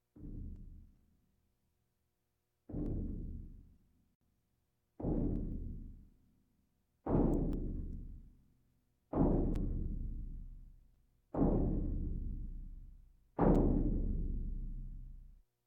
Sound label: Percussion Bongos Tympani rolls Gongs Drums